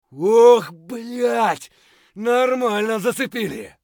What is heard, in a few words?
bear low hp; eft